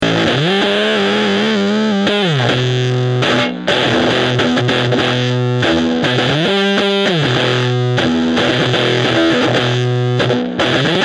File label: march of the fuzz; deathbyaudio; waveformer